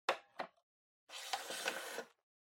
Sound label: Portable Stereo Antenna Extend